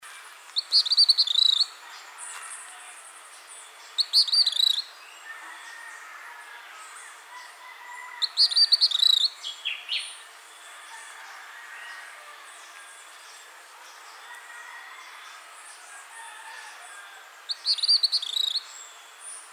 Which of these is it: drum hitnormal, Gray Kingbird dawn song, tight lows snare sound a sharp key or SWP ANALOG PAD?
Gray Kingbird dawn song